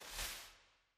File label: fairy wing; fairyhansel